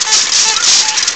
TOY TOWN; M8; CMI Factory

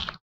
slip slap; Spectrasonics; Metamorphosis

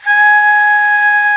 VENU G#4, India Winds; E-MU Producer Series Vol. 7 – Old World Instruments

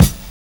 KIT, Dance Mega Drums, HIP

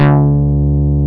FUNK G3, BASS; East West; Global Sounds

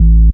bass motor A, Bass Shots, Chicago House; Classic Chicago House

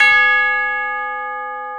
Tubular A#; Digital Sound Factory

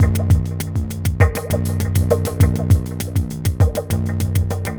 Terrestrial Hybrid, Processed Percussion

